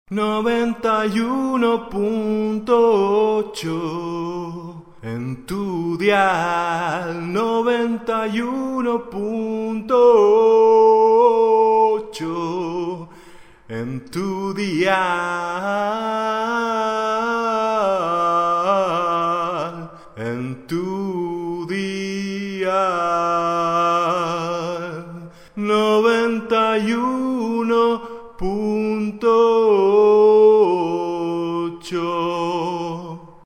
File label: cantante singer spanish neutral singer; cantante de español neutro. Dial 91 punto 8